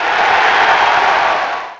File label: Cheer; Grand Theft Auto Vice City; XBOX